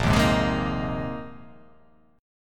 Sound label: Bb 9th Suspended 4th Bb down, Bb9sus4 chord; strummed; JGuitar